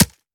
melee world fist soft; viper

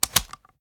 reload empty scope mag out; reloads; iw8; uzulu; gmod

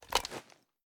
reload empty rotate; gmod

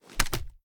raise first end, sh; gmod; wfoly plr sh charlie725

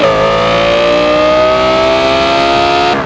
third, vehicles; gmod; tdmcars; r8 plus

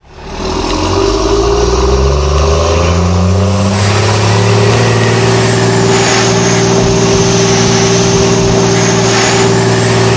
first, vehicles; dod ram 1500cummins

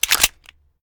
reload fast empty mag in; gmod; pi mike

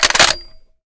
sn reload empty fast bolt close; gmod; iw8; alpha50

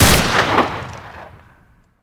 shot, weapons; f2000; gmod; fokku tc f2000

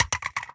phys mag drop smg metal concrete, magazine drops

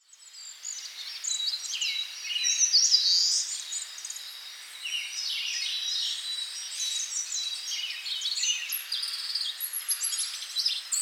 karuizawa birds

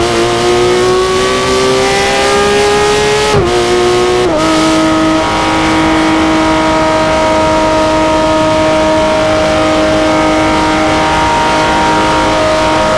fourth cruise, vehicles; gmod; tdmcars; por rsr

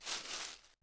leaf; gmod

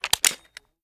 reload xmag magout; gmod

